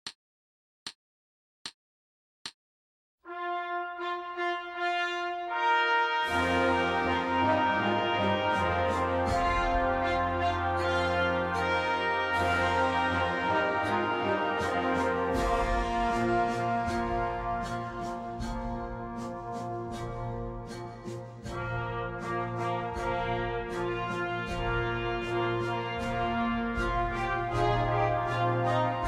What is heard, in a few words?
full band arrangement